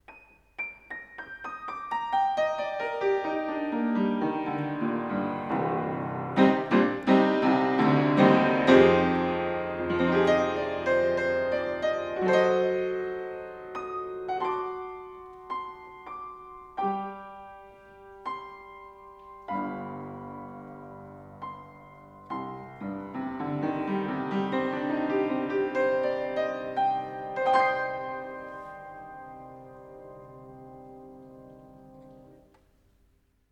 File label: upright panels on 1m pair